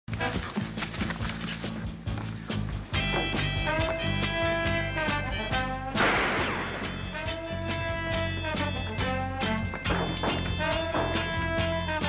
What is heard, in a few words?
music